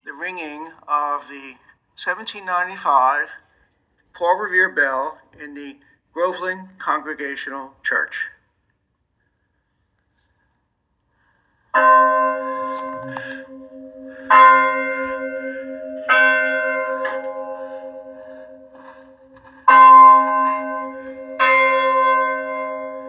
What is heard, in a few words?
bell recording, Revere Bells; groveland; The Sounds of Revere & Family Bells